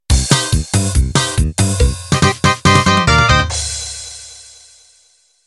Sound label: Quebradita Intro